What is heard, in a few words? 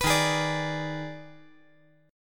Eb Major 7th Suspended 4th Sharp 5th Eb; strummed; EbM7sus4#5